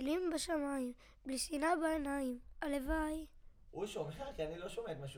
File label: VOC